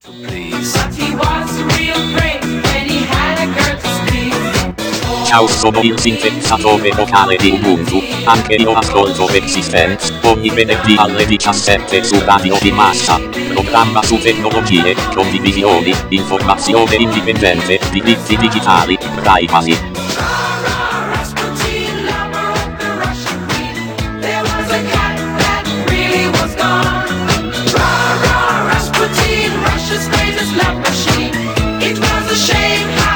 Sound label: jingle; r-eXistenZ; radio di massa